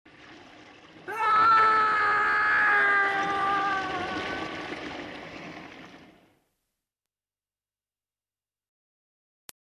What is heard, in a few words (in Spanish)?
sonido GRITO